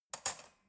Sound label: cutlery fork drawer handle soft